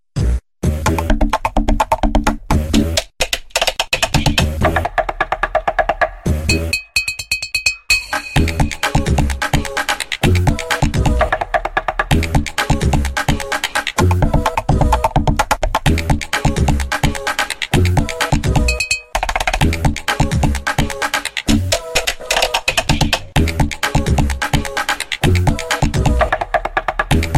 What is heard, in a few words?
kitchen mix samsung galaxy s9 ringtone